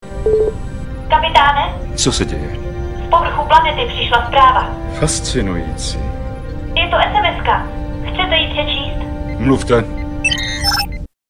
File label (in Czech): hudba; star trek 6